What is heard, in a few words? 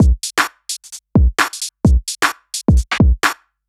Drum Full 130; Ealing